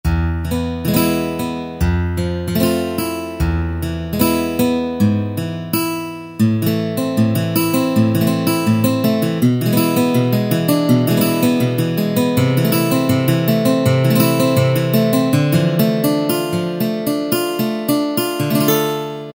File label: Gtr Snta Crz, E-mu Morpheus; Factory Presets